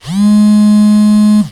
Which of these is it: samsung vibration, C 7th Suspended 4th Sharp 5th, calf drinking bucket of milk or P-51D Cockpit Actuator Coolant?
samsung vibration